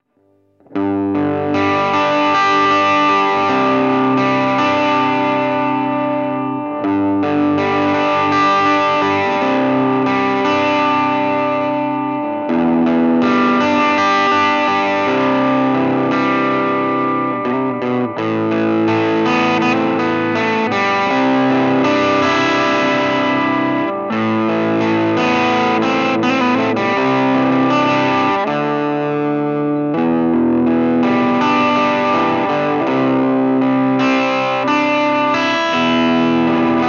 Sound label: higain